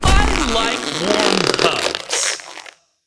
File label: activated crushing ice; BTDB2